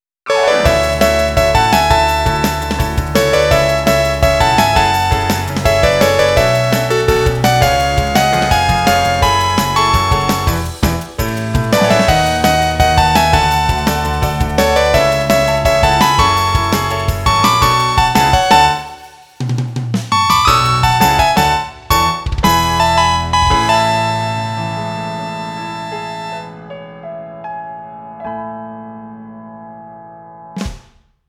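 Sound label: 8bit love melody drum chord, チップチューン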